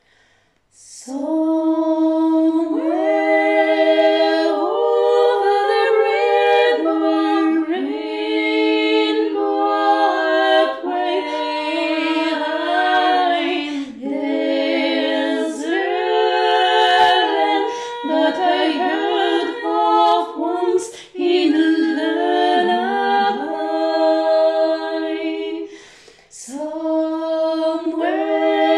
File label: tutti